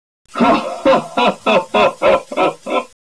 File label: taunt